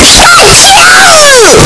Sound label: pikachu cry; pokeyellow